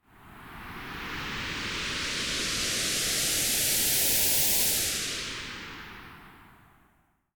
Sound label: whooosh